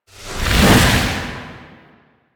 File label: Slide Swing, Melee; Warframe; Weapons; Duviri; Incarnon Adapters